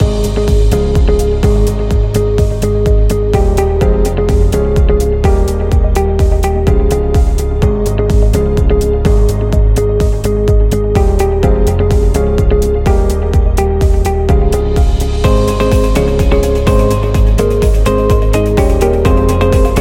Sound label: Interview Loop